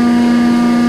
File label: Servo Medium LOOP